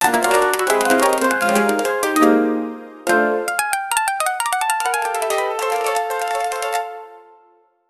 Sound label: quick circus hap